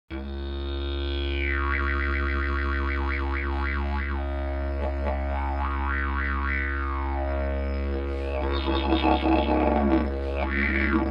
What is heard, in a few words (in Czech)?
didgeridoo, cestovni didge model b